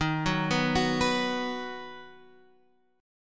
D sharp Minor Sharp 5th D sharp down Guitar Standard; arpeggiated; JGuitar